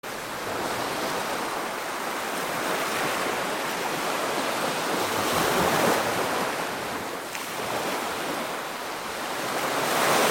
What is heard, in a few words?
Звуки средних волн в океане Sounds of medium waves in the ocean